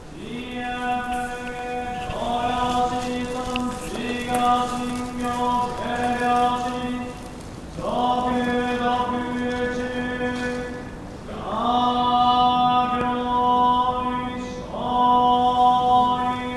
Monk Chanting

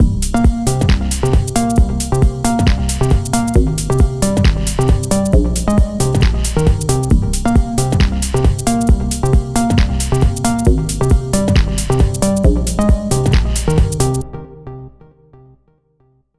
135 Ravey Game Loop

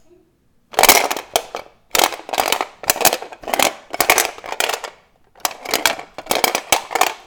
Box Rattling And Shaking

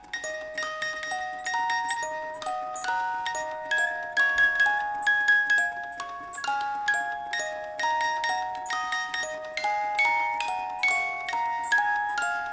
Music Box Loop

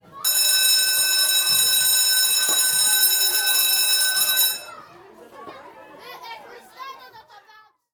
School bell; Klaverblad

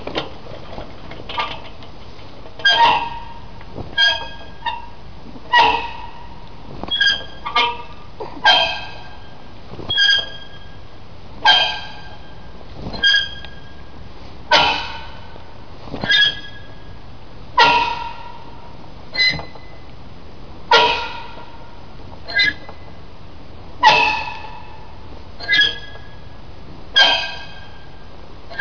Squeeking swing

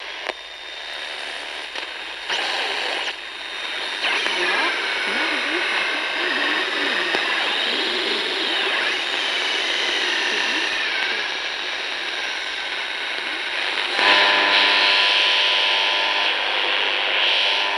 Noise

